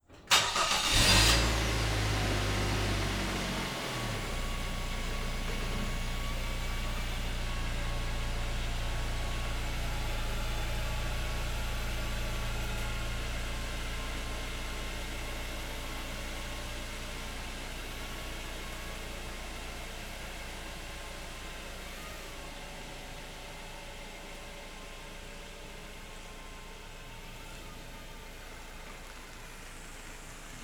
car reverse; transportation

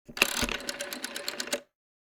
rotary phone

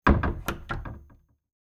closet door close

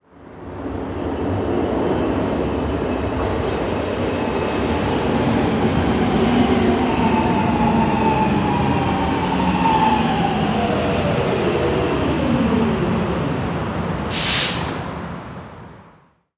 metro pull in exterior; transportation

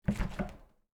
fridge open, kitchen

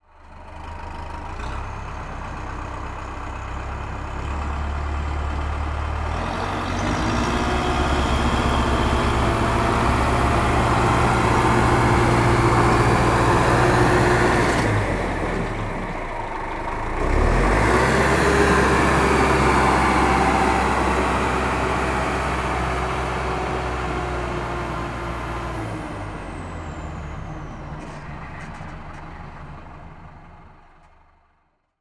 tractor move forward and back up; transportation

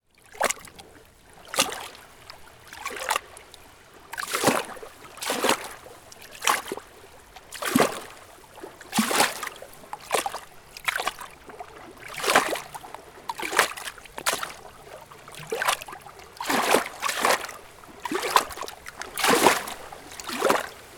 lake waves lapping gently against rocks; nature

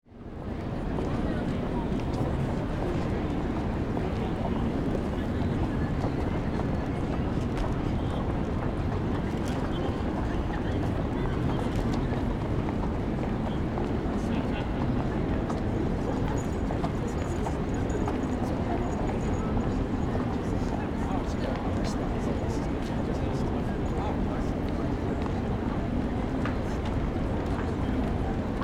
shopping square

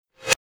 button

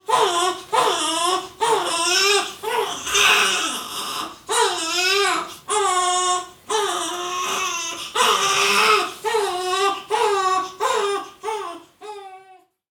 baby crying; human

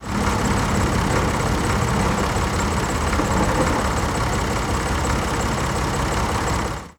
tractor idling; transportation

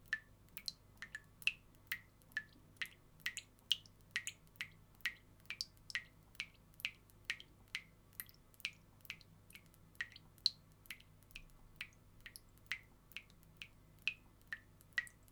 dripping faucet, kitchen

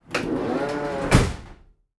van side door slide close interior perspective; transportation

